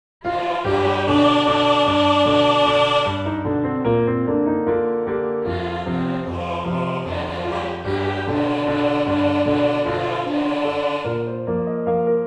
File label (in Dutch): zang piano